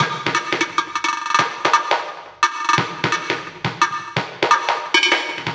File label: Mixed Percussion, percussion loops